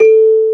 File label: CELESTA A4; MALLETS